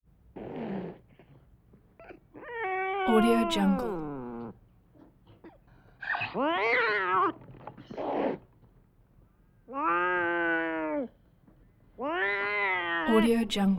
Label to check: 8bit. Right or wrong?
Wrong